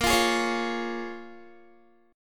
Bb Minor 7th, Guitar; Bbm7 strummed

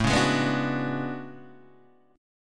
A Major 13th, Guitar DADGAD

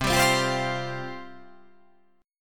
C Suspended 4th C

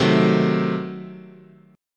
C Diminished 7th, guitar chord

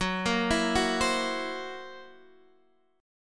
Fsharp 7th Flat 5th down Guitar Standard; arpeggiated; JGuitar

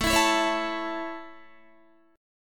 A Major, Guitar; strummed; 16,19,16,18,16,17

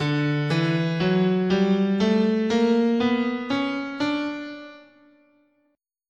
D Bebop Major, guitar, scale

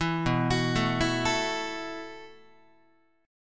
B 7th Suspended 4th B down Guitar; B7sus4; arpeggiated; JGuitar

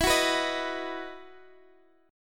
E Major 7th Suspended 2nd E, Guitar, Open D; chord shape

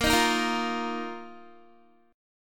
A sharp Suspended 4th Guitar; A#sus4 strummed

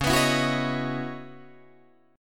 Db Minor 11th, Guitar, Drop D

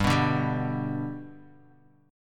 G Major G down, chord; G strummed; JGuitar

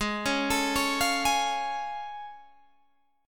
A Augmented Major 7th Ab

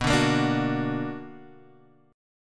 B Minor Major 7th B down, chord; strummed; JGuitar

